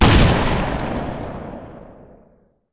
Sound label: big gun, weapons; dod 1.3; dod enhancement pack